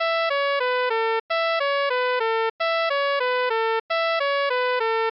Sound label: aps melody